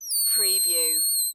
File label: Balloon Expel Air; sound effects bible